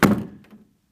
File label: Door Kick